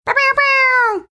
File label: making air horn sounds in clear